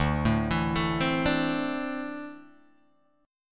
D 7th Suspended 2nd, Guitar Open G

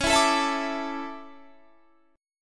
A Suspended 4th, C sharp

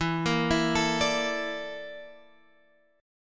Bb Major 7th Flat 5th

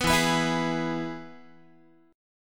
E-Major E, Guitar; strummed; JGuitar

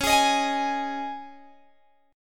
Db Suspended 2nd Guitar Open G

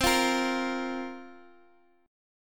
C 7th Suspended 4th, guitar

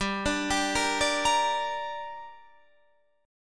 G Minor G down Guitar Half Step Down, chord

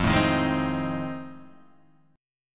F 7th Sharp 9th, Guitar, Open G

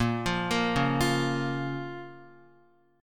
Bb Minor Bb down Guitar; arpeggiated; JGuitar

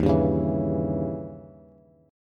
Eb 7th Flat 9th, Eb, Bass; 11,10,11,9; Eb7b9 strummed; JGuitar

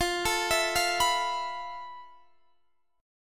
F Major 7th Flat 5th, F, down, Guitar, Standard; arpeggiated; JGuitar

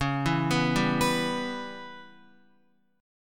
Db Minor 7th Flat 5th Db down Guitar; arpeggiated; JGuitar

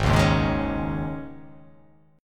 G sharp Suspended 4th chord, down; strummed

